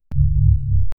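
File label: a soft ominous item pickup, bass-heavy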